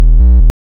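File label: waveform FM triangle triangle silence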